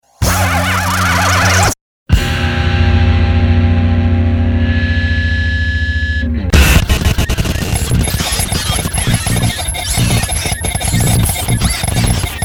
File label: Rock Music